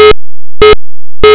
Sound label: ota beep; Motorola Q9c